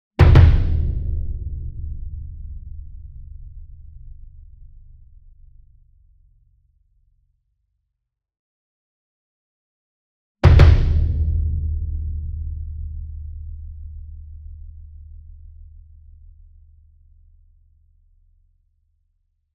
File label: SINGLE DRUM Bass Drum Concert 40 Inch Double Soft Beater Near; boom library; Cinematic Strikes Construction Kit